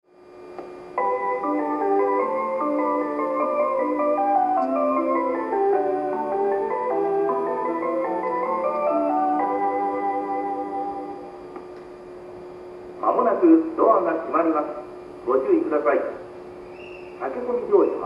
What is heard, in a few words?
kitasenju melody; jreast